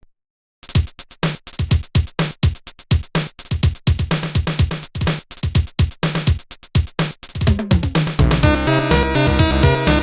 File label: Retrowave, Amiga